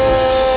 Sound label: car horn